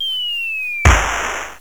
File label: firework; GMPlay; XGSfx